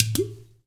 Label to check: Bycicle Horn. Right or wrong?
Wrong